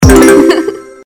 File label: party girl win; Party Girl Ways